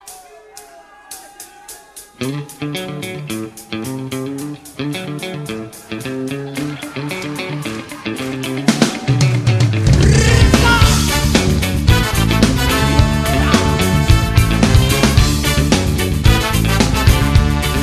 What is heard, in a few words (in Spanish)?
Parada Militar